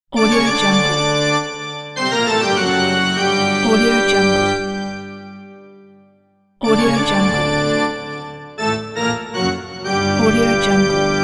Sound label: halloween Bach organ